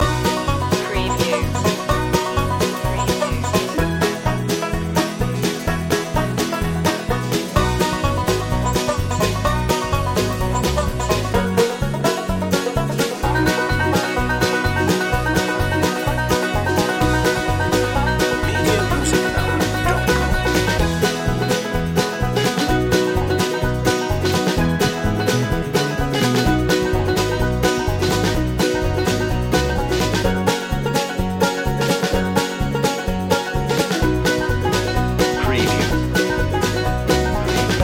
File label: cowboy hat loop